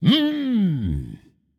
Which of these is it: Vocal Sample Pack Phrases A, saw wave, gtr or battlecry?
battlecry